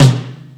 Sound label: Solid Tom, Tom One-Shots; KJ Sawka - Drum n Bass & Beyond; Drum Tool Box